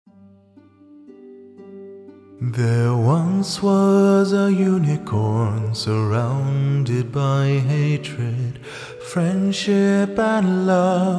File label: Lullaby; Cadence